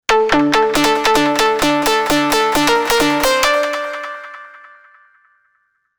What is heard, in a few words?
Tubesynth; Perky Tizz